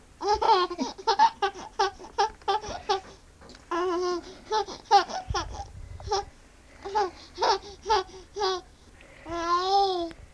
Homo sapiens; The laugh of a seven-month-old girl

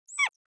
biphonic cuon

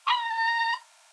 tonal dog

